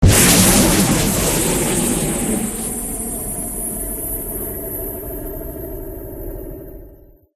Missile; Mars Sortie

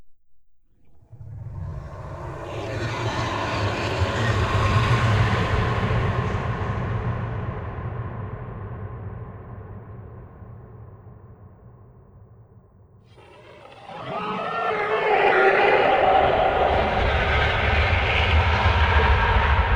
Amb Dungeon Monster

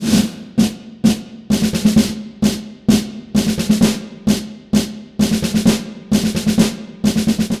snare jumper 16 beats

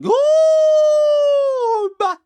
Battlecry; Hollow Knight (gamerip) (2018)

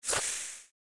Screamer Damaged

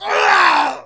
Male Die; Mars Sortie

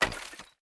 block fall; aquariumboss

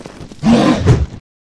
Golem Attack; Knight Online

